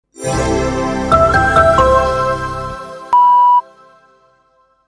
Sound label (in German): Sound Logo 4 Toene; Soundlogos für Ihr Corporate Design